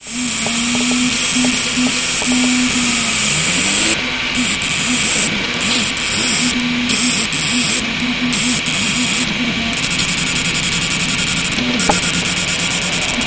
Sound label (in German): schrittmotor